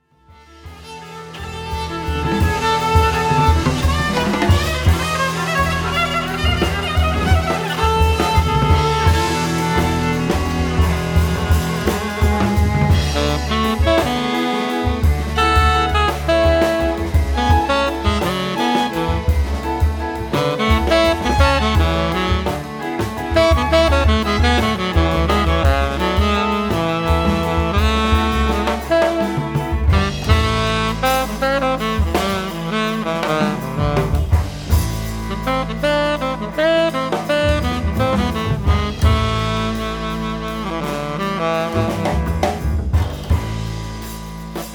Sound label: trio